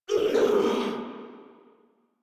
Death Grineer Female, Barks, Battle; Warframe

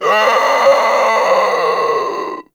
Infested Runner Death; Warframe; Runners

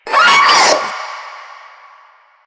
Proto Infested Runner Vocal Short Attack, Enemies; Warframe

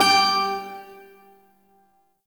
Shawzin Oct Two G; Warframe; Duviri Shawzin

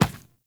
Medium Run Default, Footsteps; Enemies; Warframe

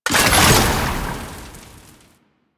Alchemy Grenade Deposit Fire; Warframe; Entrati

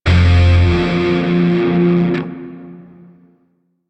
Grineer Shawzin Yo Chord F; Music; DE; Warframe

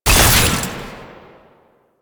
Alt Shot; Weapons; Warframe; Fulmin Prime

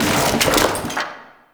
Chair Destroy Misc; ambience; Warframe; Entrati

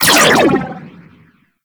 Fire, Weapons; Warframe; Tenno; Vectis Prime

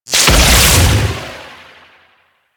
Beam Blast; Warframe; Captain Vor

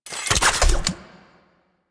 Rocket Launcher Reload; Warframe; UT Weapons

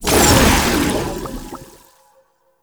Projection Spawn; Tau; Somatic Bearer

